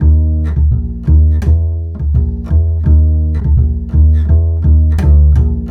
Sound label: Acoustic Bass